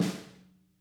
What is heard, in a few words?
SNAR, Interactive Drum Kits